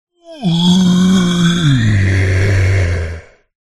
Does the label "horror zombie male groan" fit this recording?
Yes